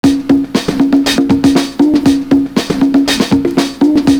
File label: UDU BEAT